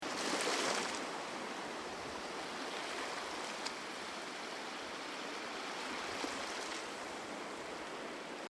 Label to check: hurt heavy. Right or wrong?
Wrong